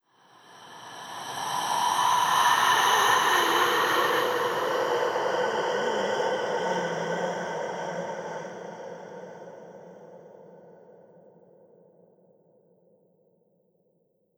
Intro Swell

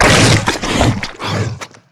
Monster Eat Human; Monster George

